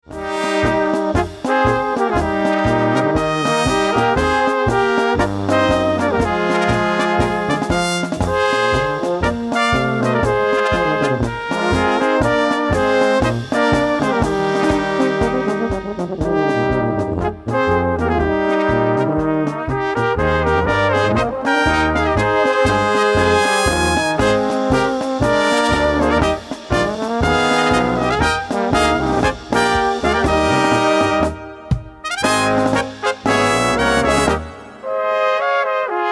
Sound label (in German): Polka